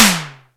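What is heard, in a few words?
electronic tom, electronic drums; Crypton; DrumBase5000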